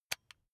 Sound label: electric button drill direction switch backward soft